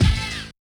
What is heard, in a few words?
Yamaha DJX 2B, Hit